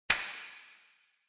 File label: Hand Foley Clap Reverb